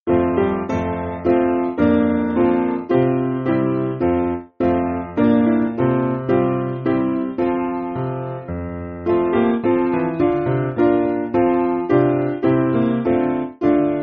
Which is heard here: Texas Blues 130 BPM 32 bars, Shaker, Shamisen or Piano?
Piano